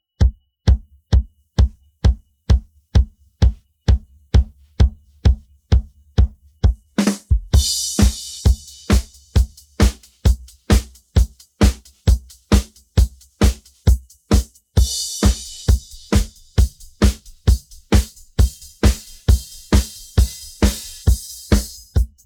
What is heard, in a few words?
pattern, drums